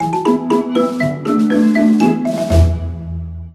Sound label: Quest Middle; Yokai Watch Busters 2 Hihou Densetsu Banbarayaa Magnum Sword